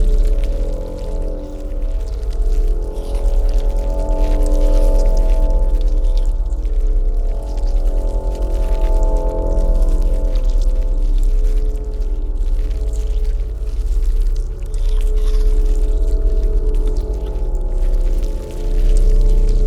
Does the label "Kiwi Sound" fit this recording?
No